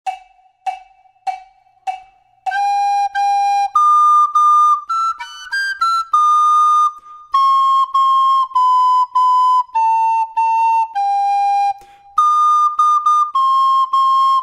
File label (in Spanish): FLAUTA SOLO; Baa Baa Black Sheep